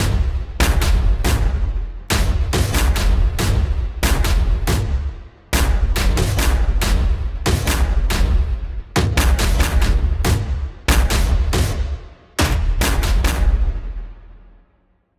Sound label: Drum 80